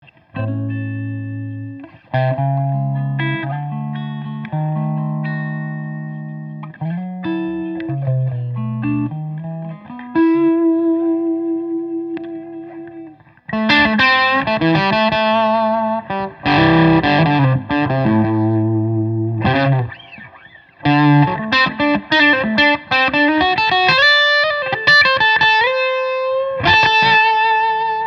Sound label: Gibson ES-335, semi hollow electric guitar; 1966; all maple